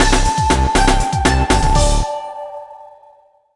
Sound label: Music Dance Ending; Littlest Pet Shop 3 Blue Team Pink Team Purple Team; NDS